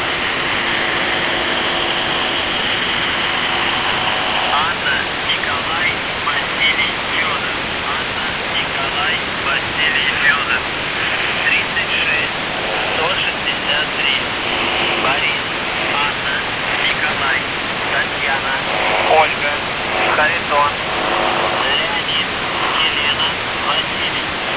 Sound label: buzzer usb msg